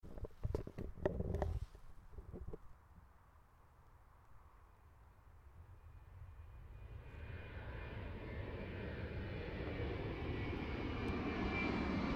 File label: Sound Airplane